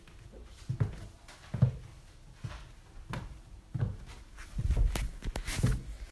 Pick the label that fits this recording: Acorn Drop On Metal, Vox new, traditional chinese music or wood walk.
wood walk